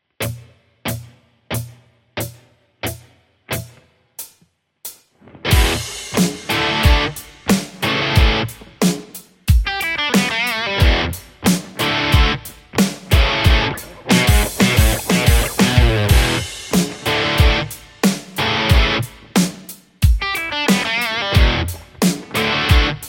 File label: tele th3; overloud